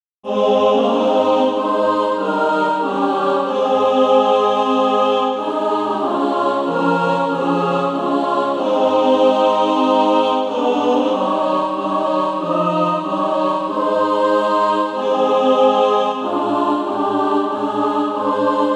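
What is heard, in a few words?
alto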